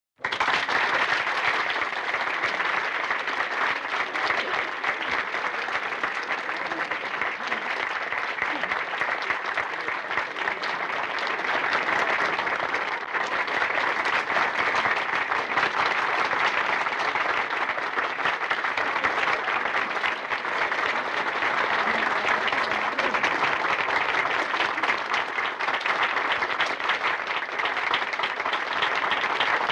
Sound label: Applause Sml Theater